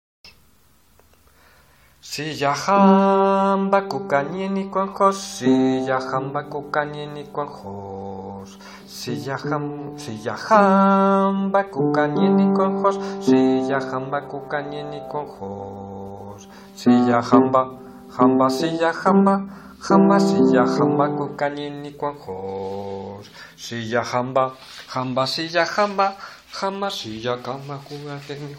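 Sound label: siyahamba bass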